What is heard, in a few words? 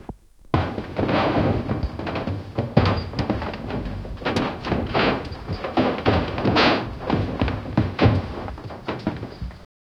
rhythmic clank and thud